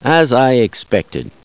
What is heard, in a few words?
startle, hostage reactions; czero opforce